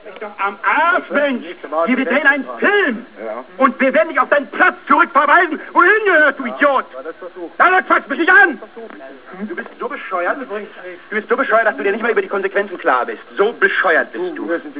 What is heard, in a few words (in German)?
amarsch